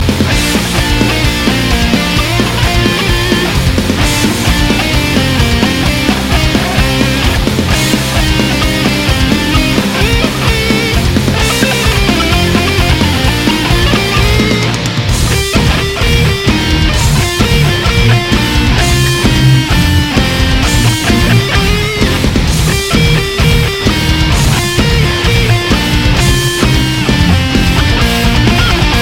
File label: Polka Metal